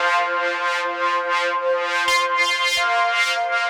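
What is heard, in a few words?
Flu Pad, eurodance; евродэнс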